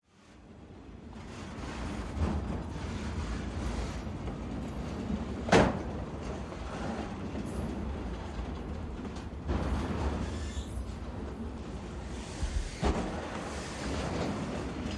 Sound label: Train By Slow W coupli, bys